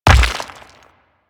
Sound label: Rock Elemental Footstep Default